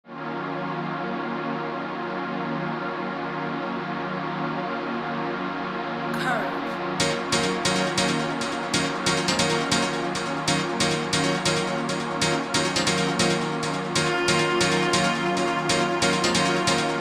Jazz

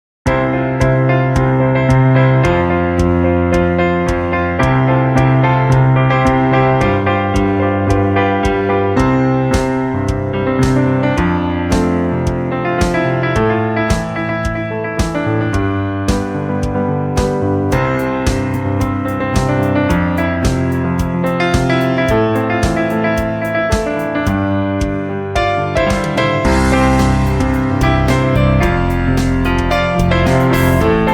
Piano Cover

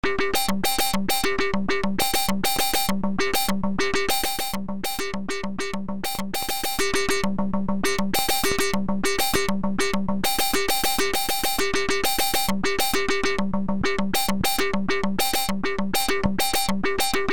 electric bonfire

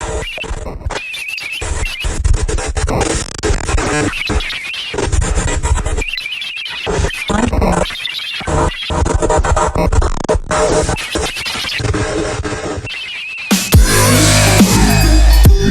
Steampunk dubstep

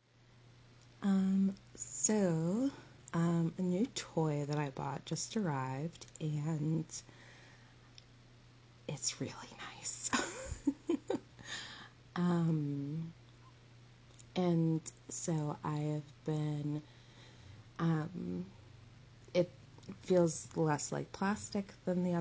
gagging bj noises giggling mild curses

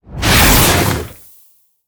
Monster; Zenless Zone Zero

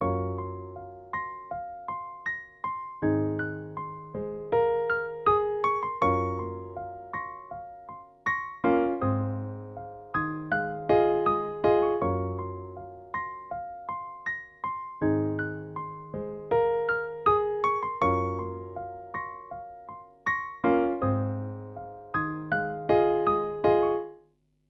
piano dry

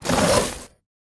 Monster; Zenless Zone Zero

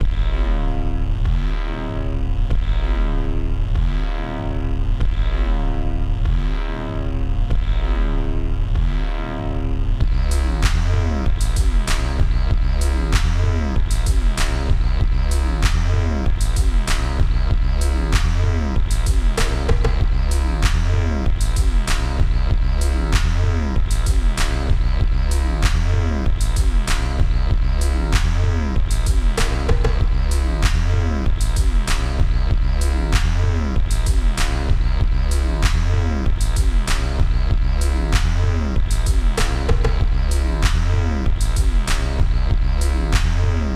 Hum Drum Line

